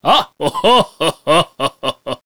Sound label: Large Win; santa